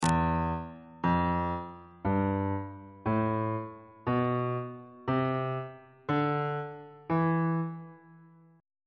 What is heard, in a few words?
f flat phrygian mode note positions on bass clef